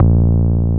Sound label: BASS, Drum & Bass, 140 BPM; Ueberschall; Drum & Bass Flash Trackz